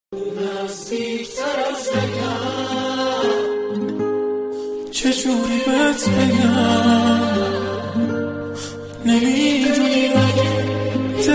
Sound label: Guitar